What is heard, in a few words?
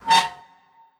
Spider Squeak